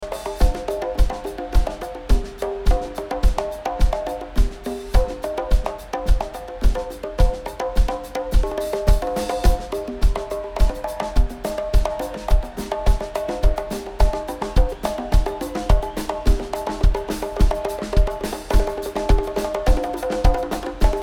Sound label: Drums